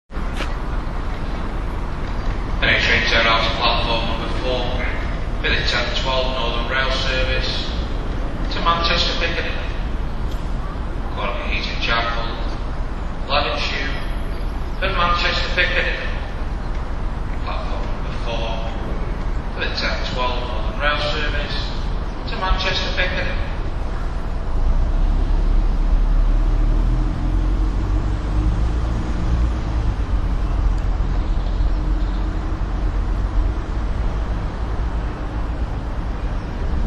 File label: stockport station platform